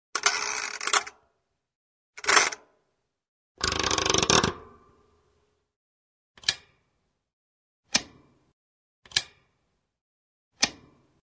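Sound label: winding mechanical alarm clock then ticking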